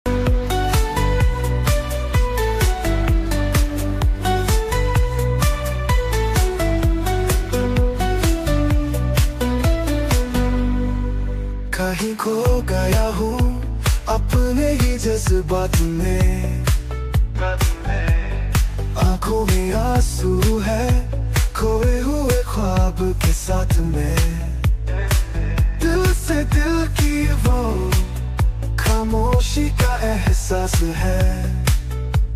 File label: Hindi Ringtone Ringtone Song Love Ringtone